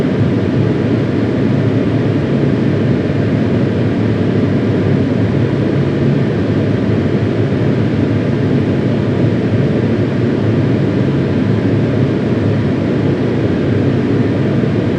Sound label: cfm comb full, CFM56B cockpit; A320